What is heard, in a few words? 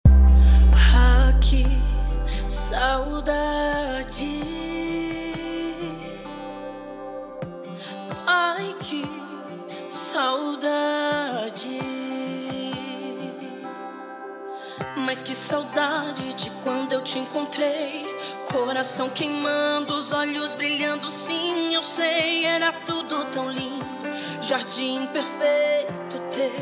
remix gospel